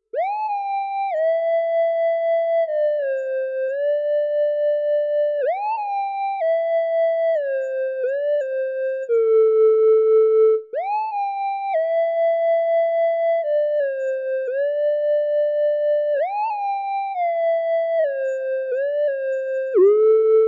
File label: Synth